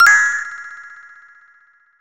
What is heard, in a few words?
Get Frog Coin!; super mario rpg 1996 snes